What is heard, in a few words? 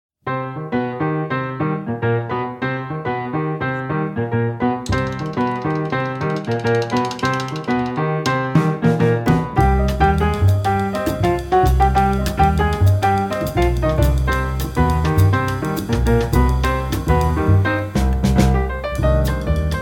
Staccato